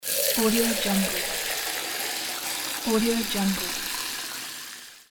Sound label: water filling in a mug